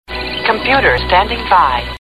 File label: huawei y7 ringtone